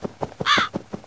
raabe fliegt; garten

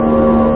DROHNE; ASSAULT; PC Gamer 46

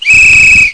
pfiff; samba2

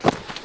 landung; SkiAlpin2005

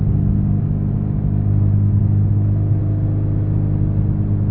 250er helm; gp500

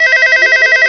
Telephon; ventafaxvoice

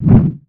kerze; bett

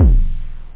thumm, techno bd; da capo vol1; drums

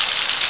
Dusch Wasser

